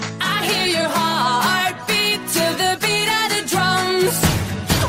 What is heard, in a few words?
beat of the drums